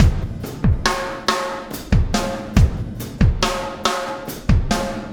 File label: Landing Party Beat, Processed Drumkits